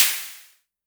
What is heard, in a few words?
Crashes; LIMAT Div Dept; Drums